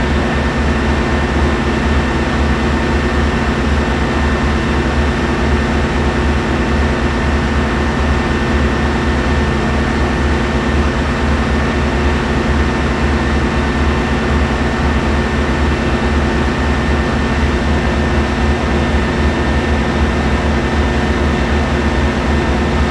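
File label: power generator bed; Star Wars Knights Of The Old Republic I; XBOX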